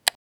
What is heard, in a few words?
video game button click